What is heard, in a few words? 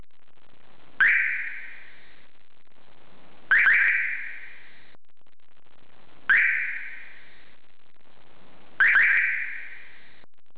siren